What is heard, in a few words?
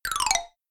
notification; samsung Aleart